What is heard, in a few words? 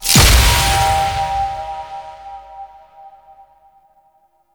Protective Shell Cast; Warframe; Citrine